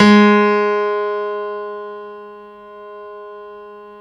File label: Stereo Grand, Keyboards